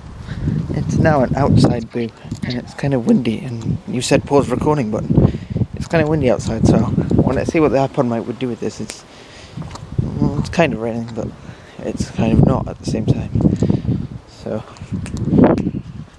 outside

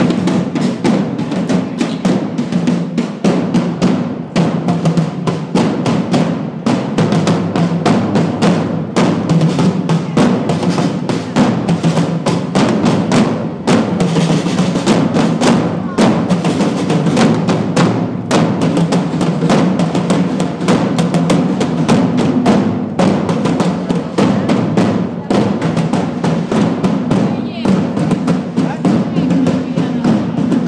medieval drums

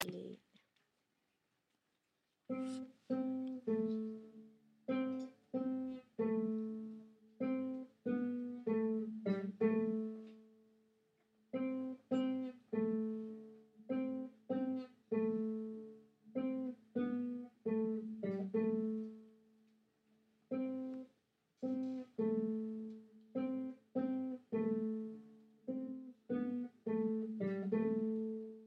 guitar solo

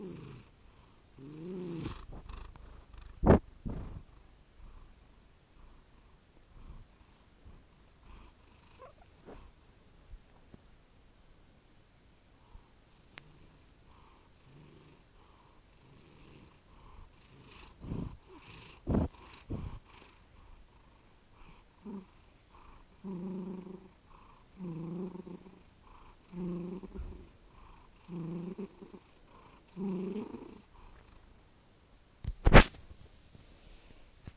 tigger purring he squeaks

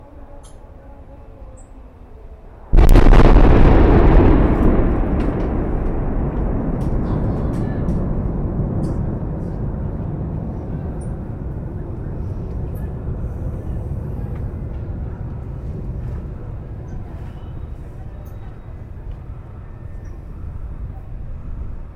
sound of gaza at night

